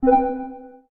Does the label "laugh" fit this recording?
No